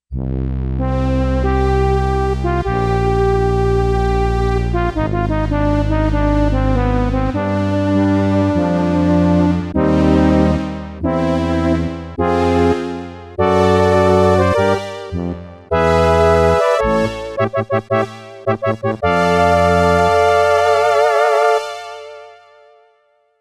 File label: Yamaha DX11 Factory Performance Voices PROGRESSIV